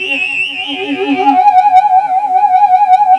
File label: Sci-Fi Hypno Sphere; Sound FX